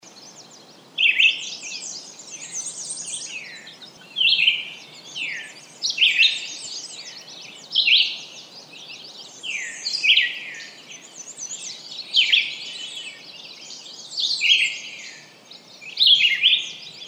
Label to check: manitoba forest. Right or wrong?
Right